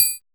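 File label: TEK triangle muted, Drums, HIP-HOP; Drums In Your FACE!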